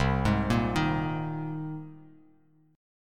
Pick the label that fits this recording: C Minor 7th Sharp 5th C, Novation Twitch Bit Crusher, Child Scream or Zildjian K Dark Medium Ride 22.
C Minor 7th Sharp 5th C